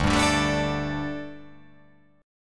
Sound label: D Suspended 4th D, down